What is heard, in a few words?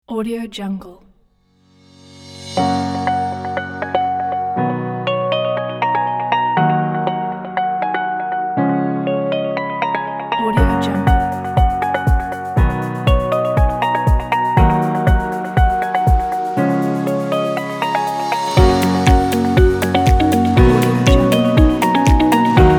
upbeat corporate motivational inspiring